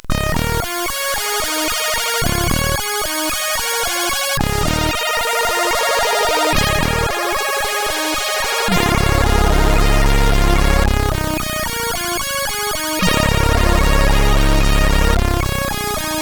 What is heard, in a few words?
Snare CSG8580R5